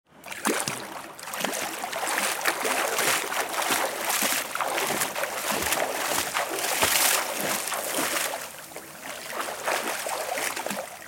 Tieng nguoi boi trong nuoc

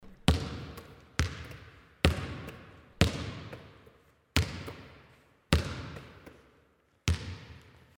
Tiếng dồi rê khi chơi bóng rổ

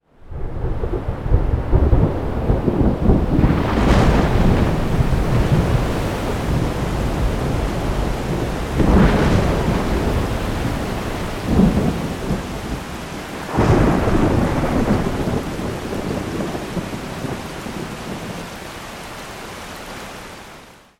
Âm thanh mưa gió và sấm sét

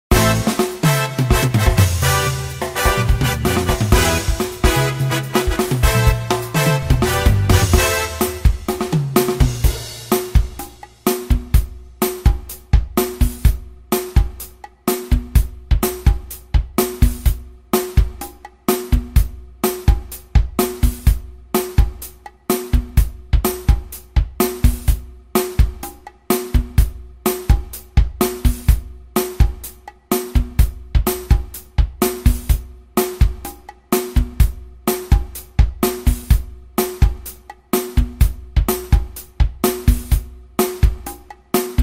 nhac doc ve cha cha cha

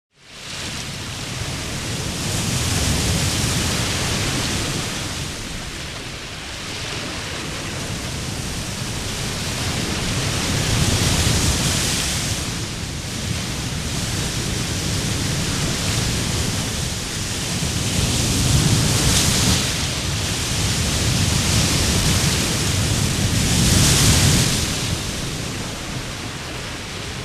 Am thanh tieng song bien ri rao

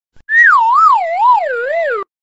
am thanh choang vang chong mat

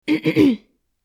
tieng um u o co to ve kho chiu ra am hieu

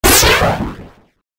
tieng ten lua bay hoat hinh

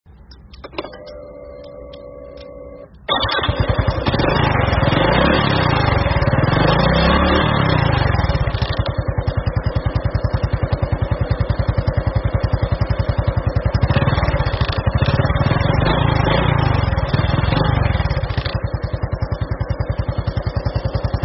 tieng khoi dong xe may

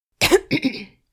tieng ho khan o co hong ngan

